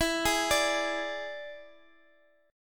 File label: D Suspended 2nd Flat 5th E, guitar